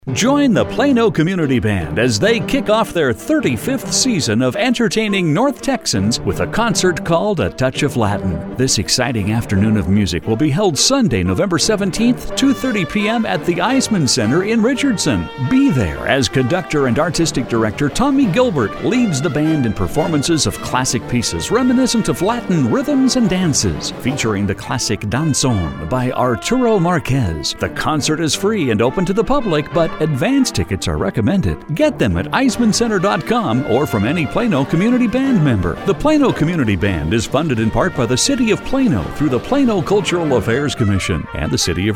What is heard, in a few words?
Community Band; Plano